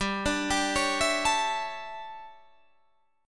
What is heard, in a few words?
D Major 7th Suspended 2nd Suspended 4th